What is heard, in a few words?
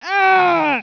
aargh